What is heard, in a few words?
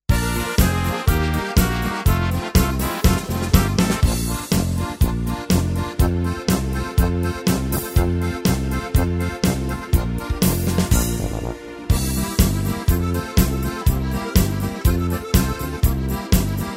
Party Polka, tyros2; March Waltz